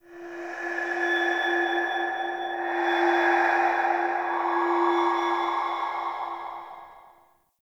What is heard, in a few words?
Cave Shrine Spirit Bells, Ambience; Midrath Island